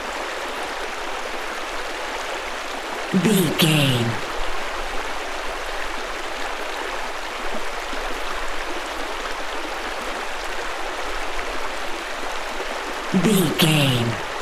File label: river stream night flowing water insects loop